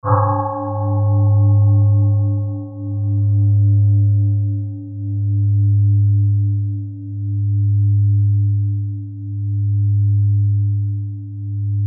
japan black racko bowls, G

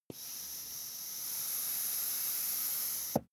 air pump ball

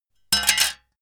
metal plate thin drop

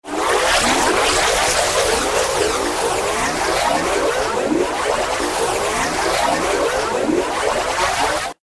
noisy modulated light

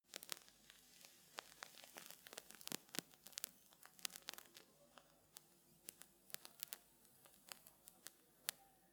matchstick burn

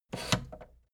fader plastic scrape single down halfway medium

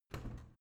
washing machine modern open

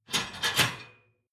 dishwasher frame fold up

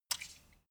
bowstring shot

